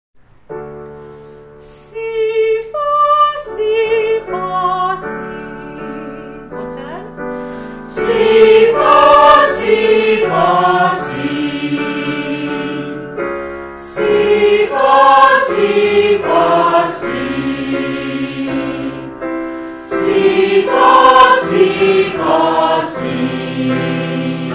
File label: See Far Scales